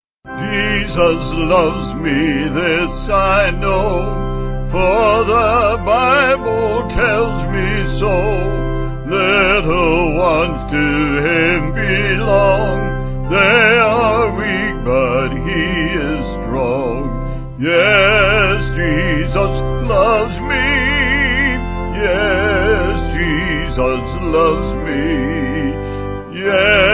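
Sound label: Vocals Organ, church music backing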